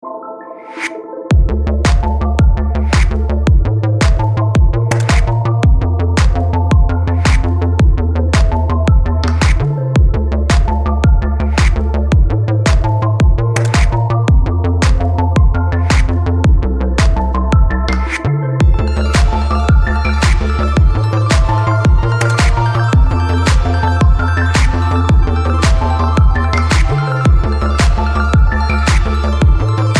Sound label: Synthwave Ringtone